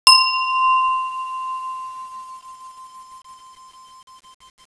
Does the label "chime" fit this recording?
Yes